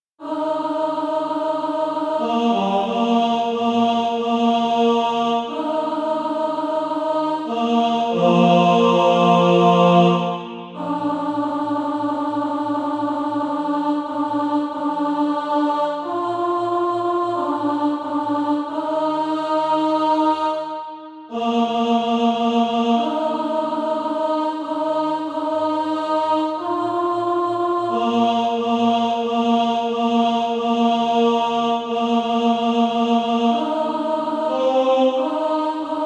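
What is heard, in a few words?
tenor, SATB Practise Music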